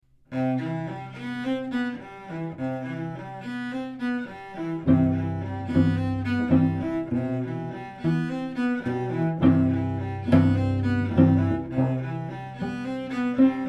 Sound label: Etude for 2 Cellos